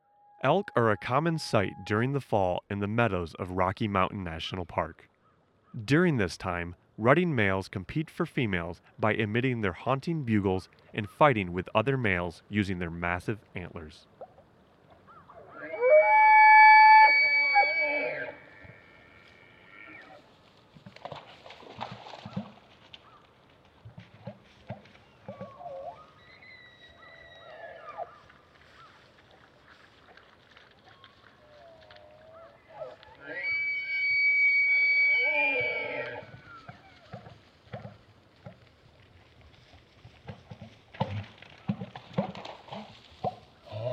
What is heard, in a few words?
Elk; sound and light ecology team